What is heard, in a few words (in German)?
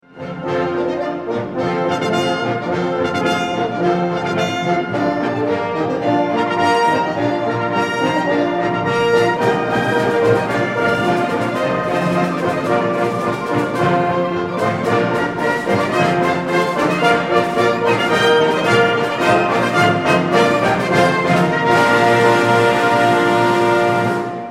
Feuerwerksmusik IV La Rejoussance; Klassik Oper; Haendel